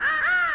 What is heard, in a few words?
silly elec fx